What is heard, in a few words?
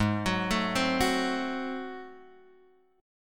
Ab 7th Flat 9th down Guitar Open G